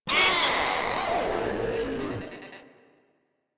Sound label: Proto Infested death; Warframe; InfestedNinetyNine